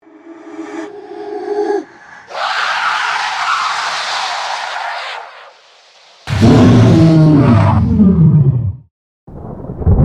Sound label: field and foley horror film sound fx